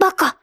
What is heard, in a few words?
combo break; osu